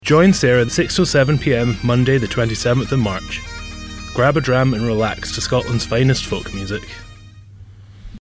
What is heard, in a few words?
scot jingl